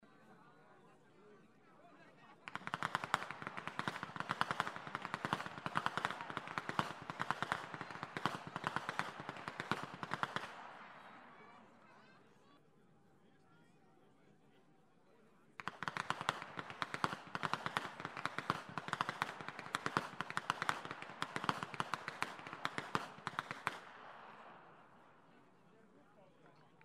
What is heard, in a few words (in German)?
schnalzen, flachgau; ANTHERING IV